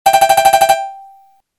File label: Simple Bell; Samsung X01SC-Omnia-PRO